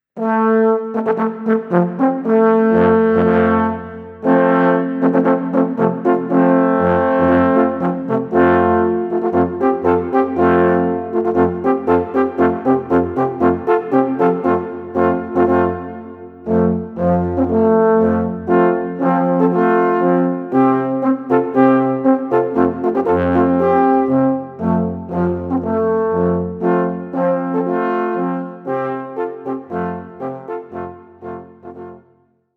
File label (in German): Alphorn Fanfare